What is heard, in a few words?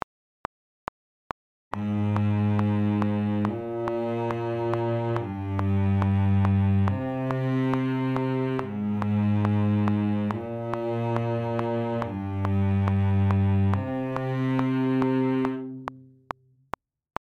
Cello